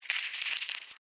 Beetle Scurry, Animal, Beetle, Scurry, Movement, Insect, Bug; Blast Wave